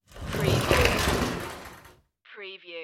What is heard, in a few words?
sliding door